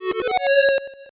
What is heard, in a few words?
8bit se